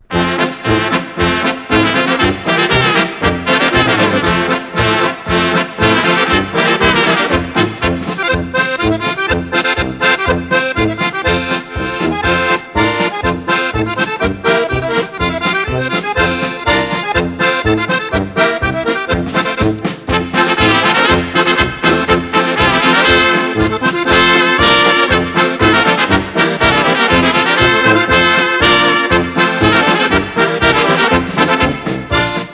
Schmalz Polka

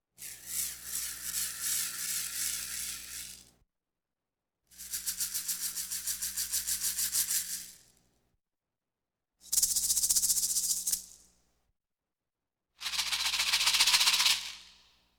Rattles Percussion Accent; Electa Quinney

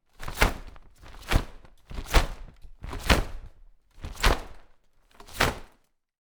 INT Cloth Frump Thump; Transitions; Poetry Project

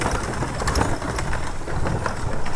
Horse Drawn Wagon; Electa Quinney

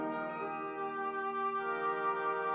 Music Only

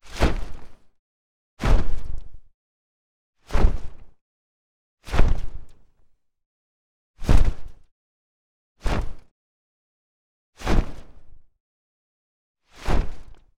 Cloth Frump; Poetry Project

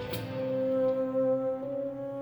Music Only; Electa Quinney

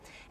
Birds; University Place; Why Birds Sing; RX 9 De-click

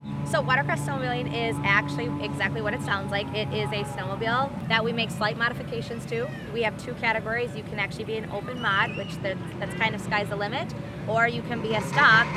Snowmobile Watercross; Wisconsin Life

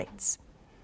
Birds

